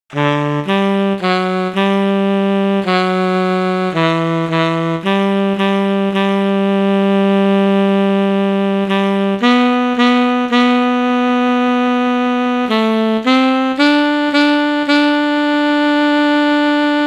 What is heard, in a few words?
tenor sax